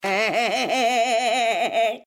Mad Woman Laugh

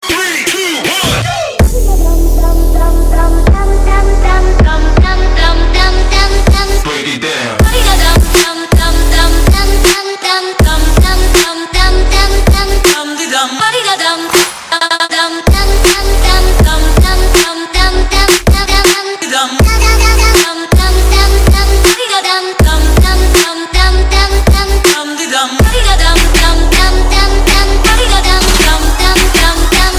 trap

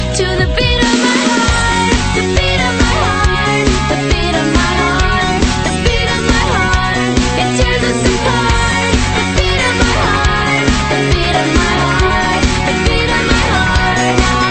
Beat My Heart

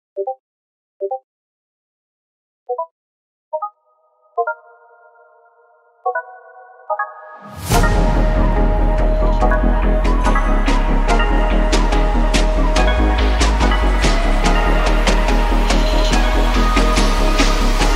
Ping Remix

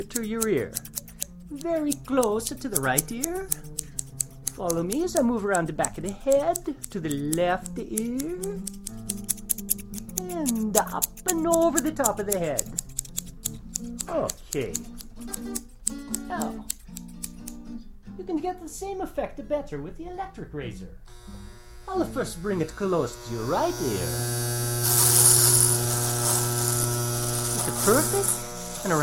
Virtual Barbershop

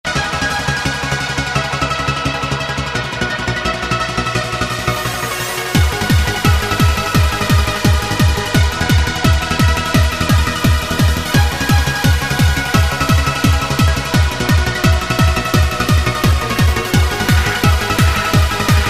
Nightclub Mix

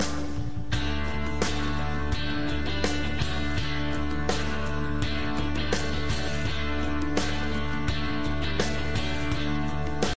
Southern Rock

